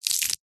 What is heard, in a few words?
horror fingernail rip off; zapsplat